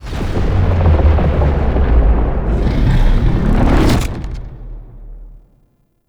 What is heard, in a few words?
Intro Stinger; Warframe; Tau; Betrayer